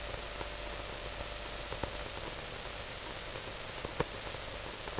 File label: Misc Noise; layering